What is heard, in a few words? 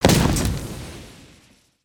Pillar Impact Short